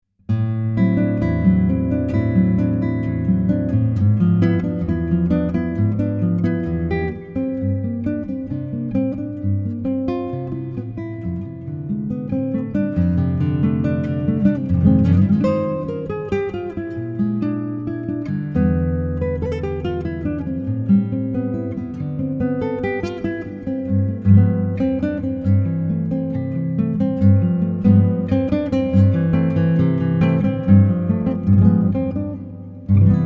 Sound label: Brazilian, classical guitar